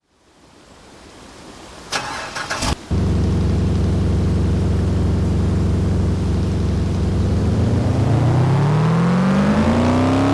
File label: audi r8 v10; 2019; tdu; decennium